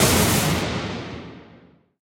thunder Big; temple of nudges

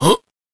santa Pulls; jingle spin

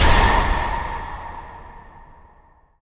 big Win Start; jack and beanstalk

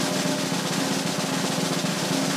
Reelspin; colossal pinatas

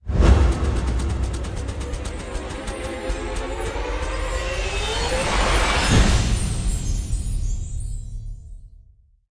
mystery spin; jumanji

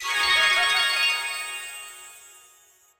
fairy Flash; fairymirror

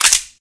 quick Stop

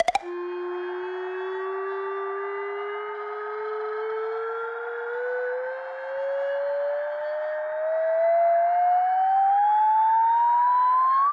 reel near win; flowers

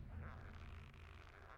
catcher Idle; fairymirror

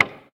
slider Decrease Click; jingle spin

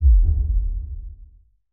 multiplier Nova Bump; starburstxxxtremeusa

